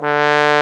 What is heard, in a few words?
BRS BS BONE, LOW BRASS; Roland - LA Composer vol. 1